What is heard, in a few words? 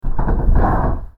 horse